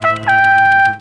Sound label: humming